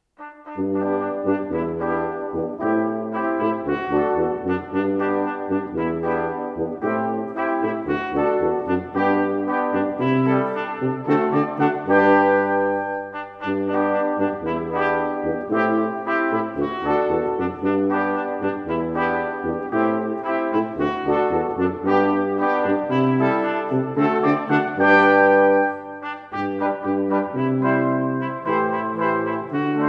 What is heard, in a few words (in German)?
Trompeten; Rabauken